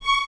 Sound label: Violins C#6; Miroslav Vitous; String Ensembles